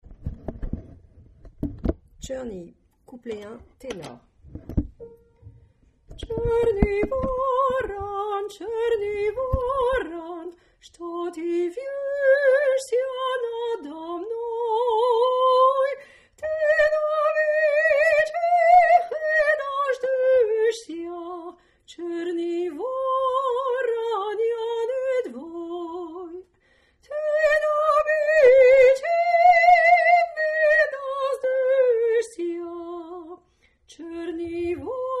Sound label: Tenor